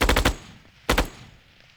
mg-42 burst; WAR

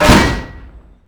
stug critical hit; WAR

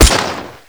light rifle shot; WAR

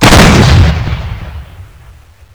tiger cannon fire; WAR

